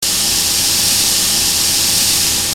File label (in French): Pschit; bruitage; Liquides